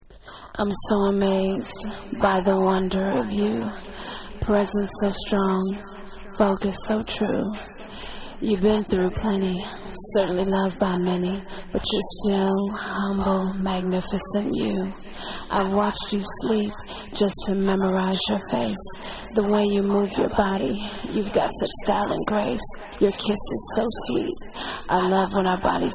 Acapella

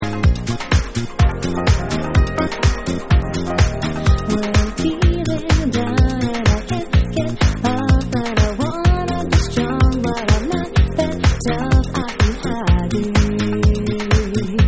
Vocal Mix

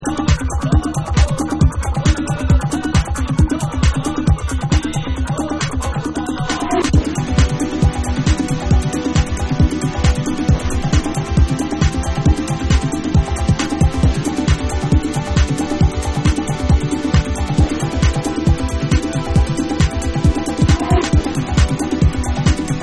DRUME

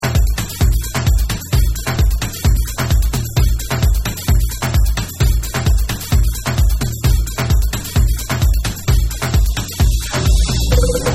THE DRUM

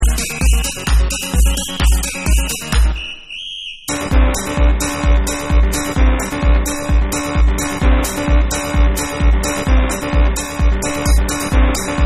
WHISTLE TUNE

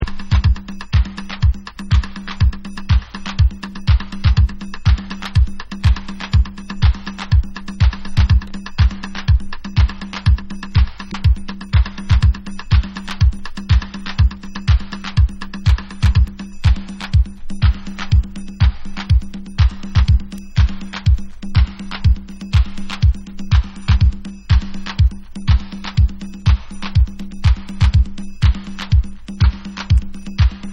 Percussion

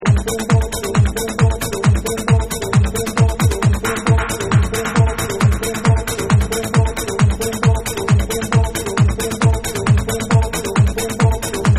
Progressive Trance Anthem

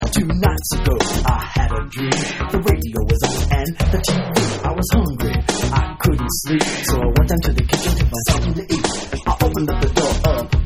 Vocal Remixed Version

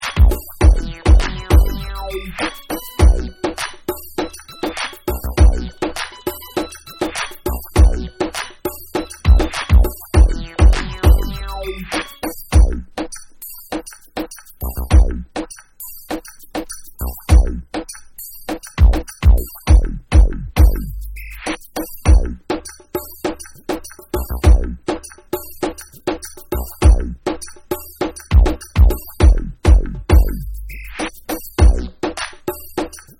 Instrumental

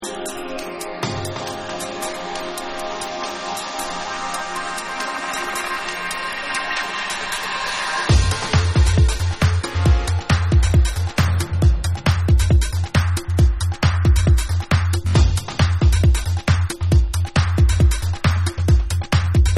Trap Kit Remix

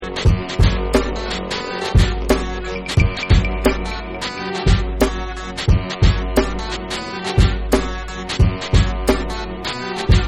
ROCK N ROLL Instrumental

